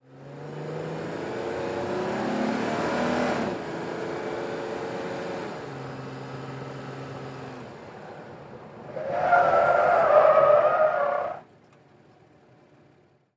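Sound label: SUV Truck 180 Skid Mix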